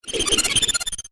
Laser Slingshot Laser Weapon, science fiction sound effect; Laser Gun Sound Effects; Andrew Fleming